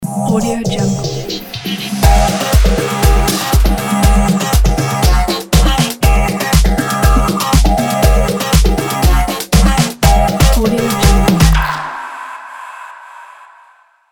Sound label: corporate modern ident logo